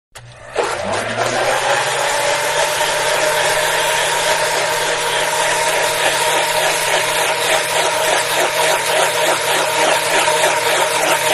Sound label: Electric Blender Wet Ingredient; Appliances; Household; Blender Electric-Wet Ingredients; Manhattan Production Music